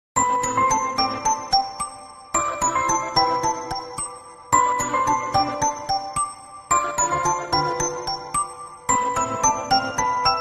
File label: Mobile Ringtone